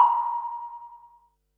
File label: Perc, Percussion and FX; Pearl SC-40